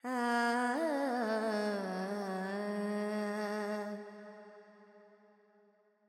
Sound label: FX Phrygian, Carnatic Female Alaps; Vocals; 70 G; Sample Banks